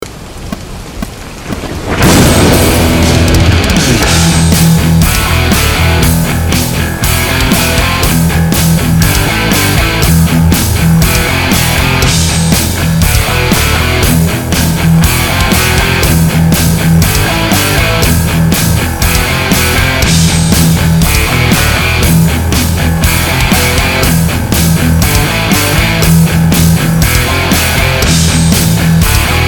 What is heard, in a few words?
Metal Drill